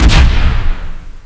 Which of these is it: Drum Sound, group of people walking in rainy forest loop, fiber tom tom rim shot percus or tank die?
tank die